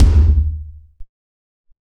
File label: Kick, Drum & Bass; AMG - Prototype Drum & Bass